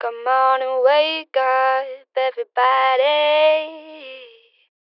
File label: Phrase Dry 70 BPM C Min, Vocals; Wake Up; Cymatics - Eternity Sample Pack; Phrases